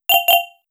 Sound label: Game Bright Sound Error